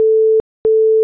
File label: short gap, silence middle; long gap